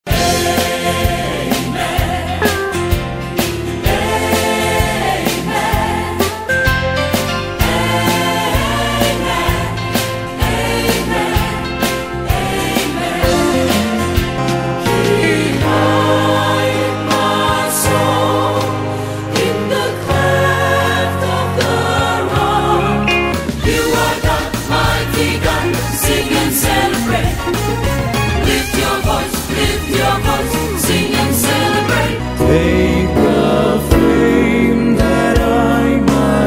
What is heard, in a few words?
Choir